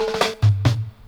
140 FILL DRY, Dub, DRUM LOOPS, 140 BPM; Drumdrops In Dub VOL-1

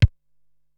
Tight Kick, Ensoniq SQ-R-Plus

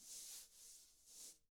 TAMA Drums; ILIO; Double Platinum Drums 1

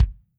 KICK, Drums Percussion

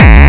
kick

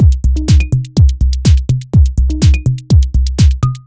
G 124bpm, House Drumloops; 90 s Sample CDs; Zero-G House Fabrik Drumloops; ZG Drumloops

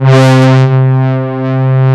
MOOG; E-MU Formula 4000 Series Vol. 3; Analog Odyssey; Moog Synths

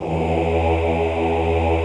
OHH E1, Classical Choir; Best Service; Extended Classical Choir

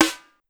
Snare, Pitched Up; Clavia DDrum-AT

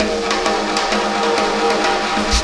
Ripple Perc

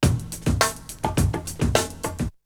Drumloop; ZERO G - Datafile DISC 3

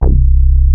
Sub Sonic Bass C2; E-MU Formula 4000 Series Vol. 1; Hip Hop Nation

